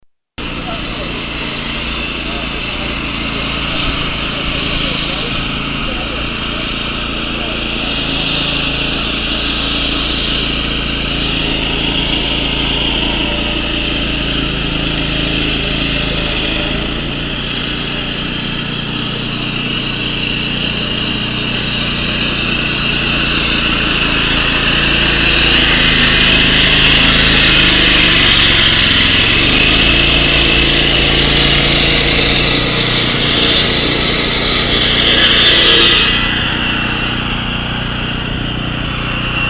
Kompressor und Schneiden

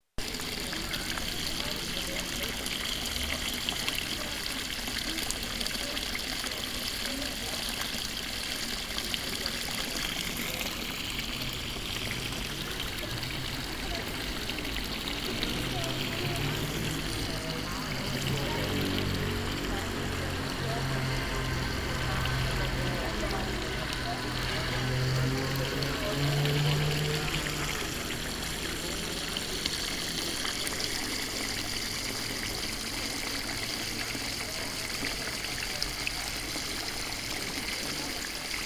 Brunnen; SolarCity

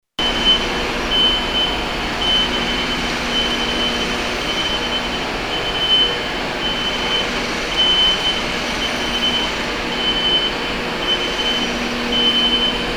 Busterminal Linz LKW

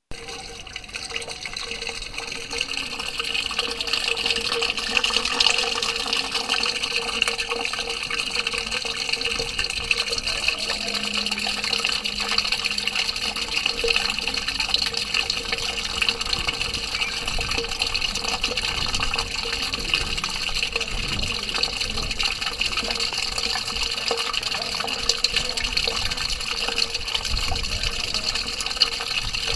Hundertwasserbrunnen; Spittelwiese

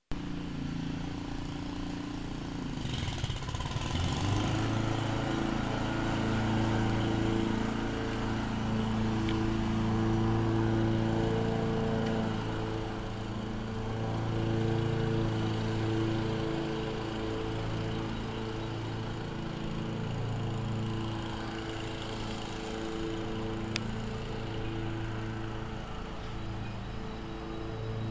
Rasenmaeher Kindergarten; Krausstrasse

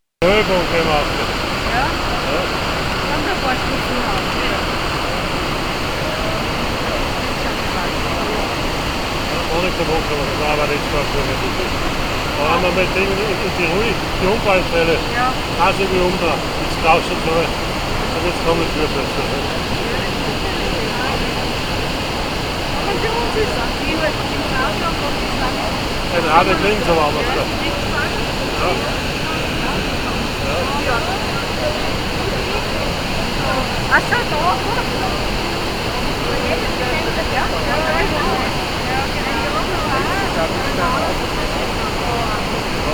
Rheinfall zu Fuss

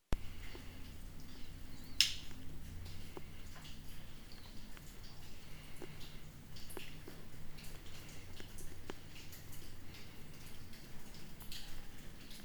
Im Tunnel

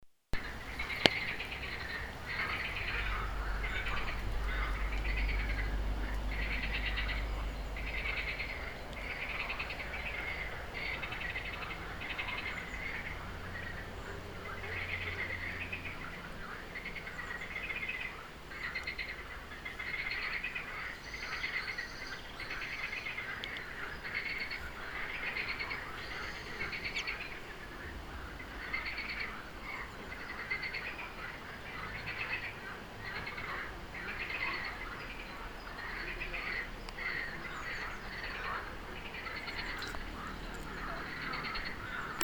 Froesche

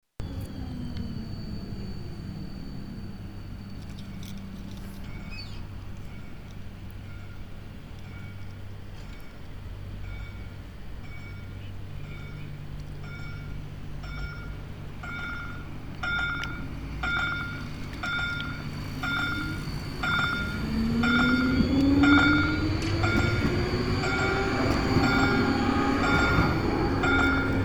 Schloss Traun Signal

